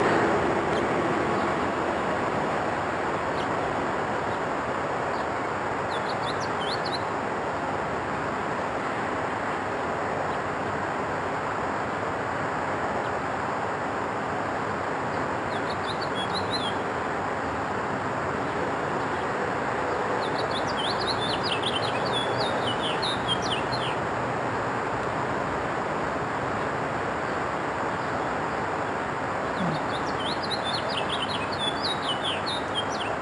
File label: song sparrow songs